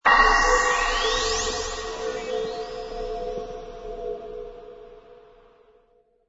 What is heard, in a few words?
Music Last Final